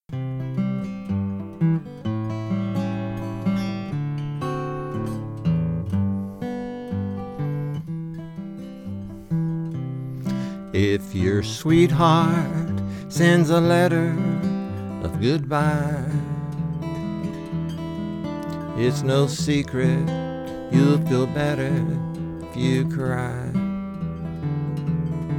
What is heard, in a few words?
cry